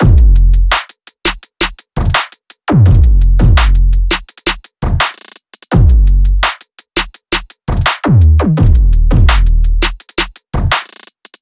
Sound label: Fm drums; M8; percussion and foley